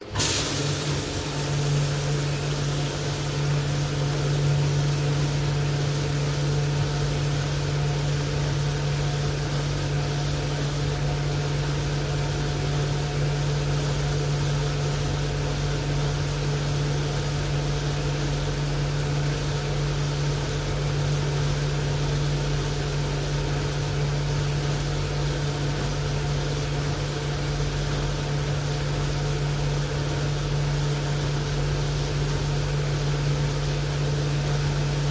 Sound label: pub glass washer machine full cycle; field reports